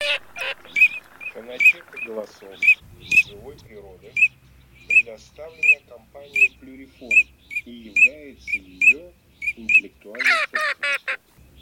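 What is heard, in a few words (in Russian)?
Чирок-свистунок Alzavola, манок; plurifon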